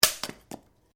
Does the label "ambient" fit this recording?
No